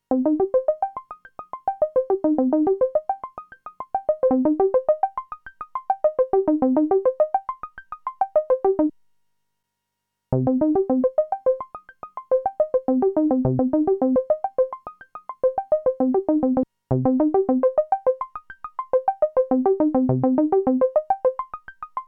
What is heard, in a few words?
juno vs u-no arp